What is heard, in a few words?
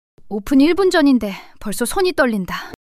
fluster solo fluster